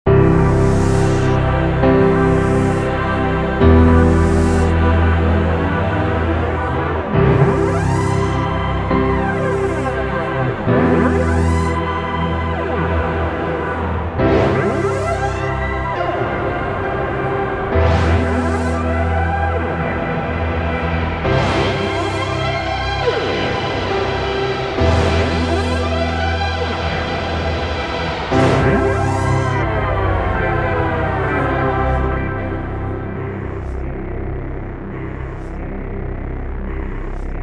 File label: Sequential Prophet X; PX Tease